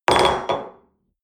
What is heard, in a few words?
espresso upset table hard, restaurant cafe